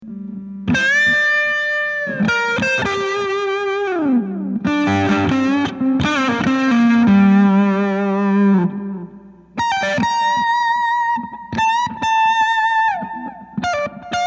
Colorsound Tone

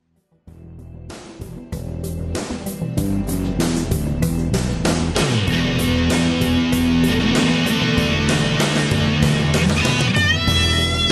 solo HM2

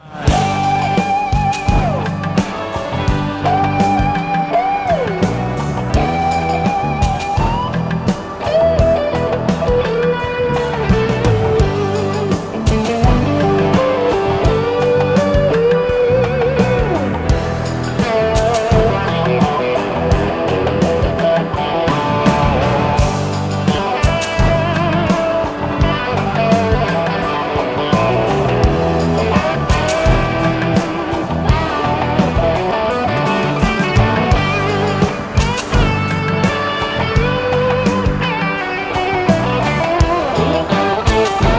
solo

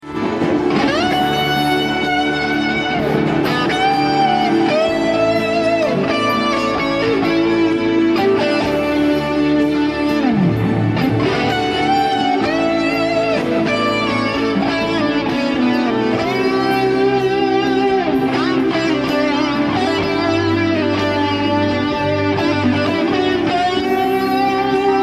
Solos Rams Head Big Muff, Live Broadcast; EXH "Ram's Head" Big Muff; Live broadcast 2016

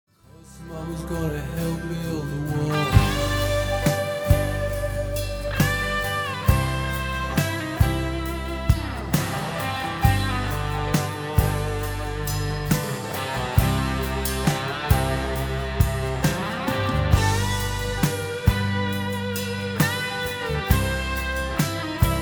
solo studio recording

